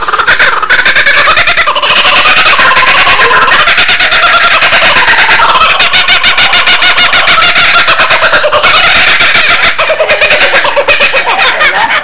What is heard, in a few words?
kookaburra